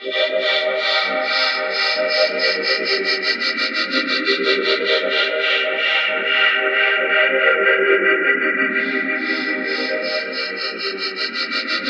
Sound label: BELLSONIC; East West - Twisted Textures-BT CD3